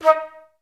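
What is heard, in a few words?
flute staccato D#4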